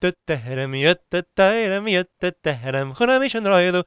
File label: GAELIC, World Voices; ILIO; Vocal Planet